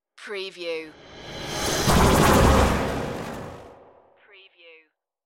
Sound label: flutter wobble whoosh